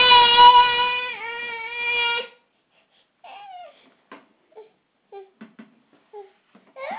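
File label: baby hungry, male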